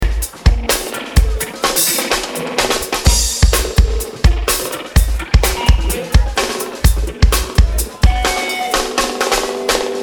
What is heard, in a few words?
Drum Dub